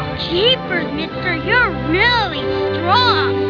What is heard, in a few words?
pain